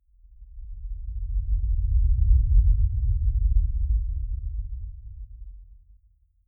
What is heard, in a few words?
low rumble